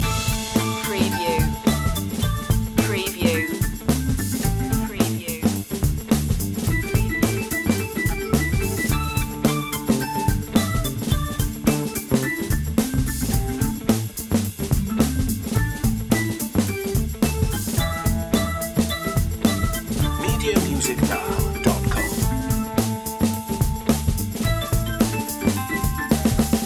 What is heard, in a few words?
casino funk loop